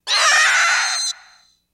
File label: Zombie Cry Lower; zombies